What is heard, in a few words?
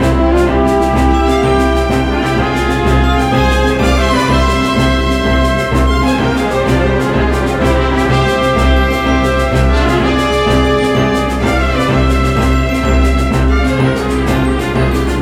Dungeon Clear Loop; Gran Saga